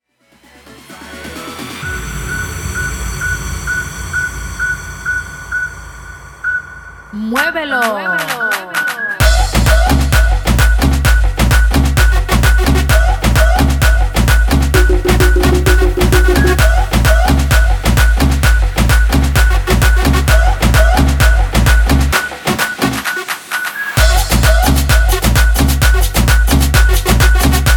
guaracha remix 130 bpm

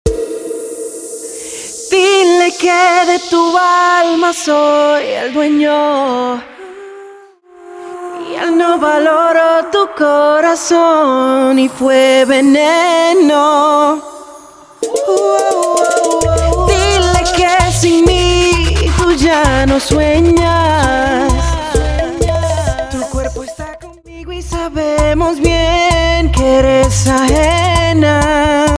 Open Show 130 bpm; Latin genres

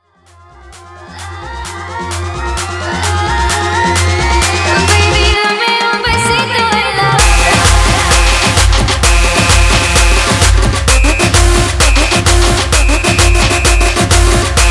tribal remix 130 bpm

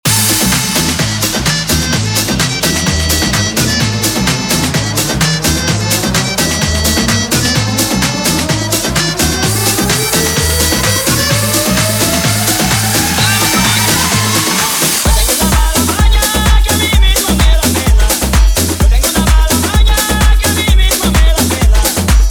tech remix 128 bpm

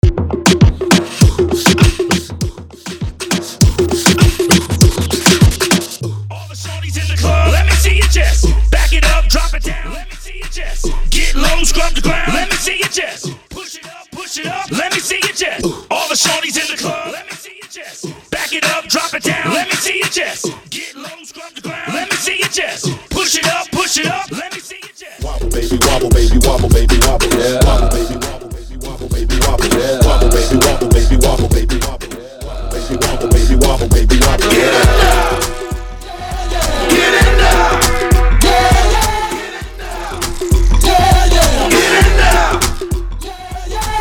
intro acapella 100bpm; remixer from Nicaragua

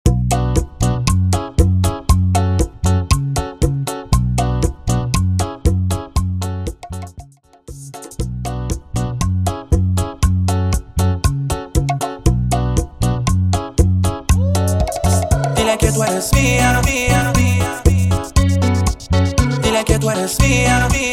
Bachata Project 118 BPM

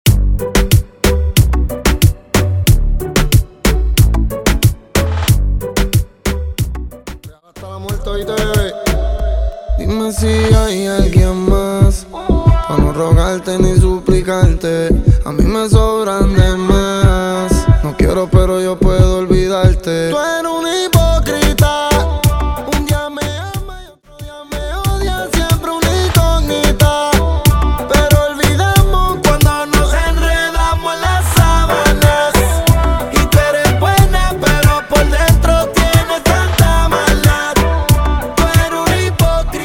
Percapella Intro Outro 92 BPM

